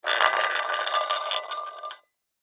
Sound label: Tin Can Roll, Tin Can Empty Roll Short Cement; Rolling; Foley